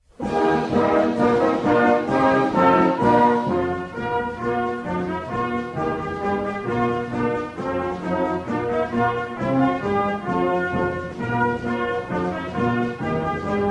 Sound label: trumpets in command, Band with Solo Ensemble